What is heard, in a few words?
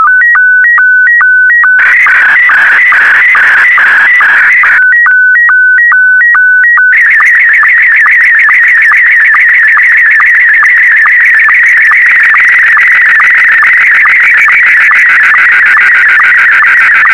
sstv